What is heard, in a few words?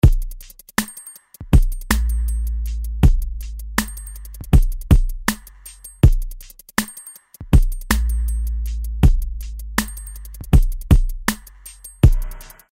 HIP HOP DRUMS